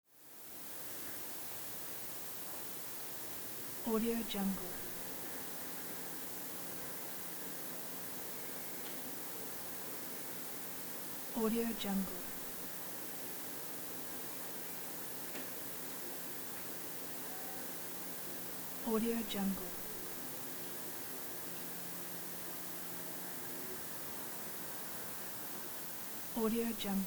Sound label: room tone noise; pure-noise